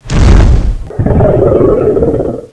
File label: stun; angra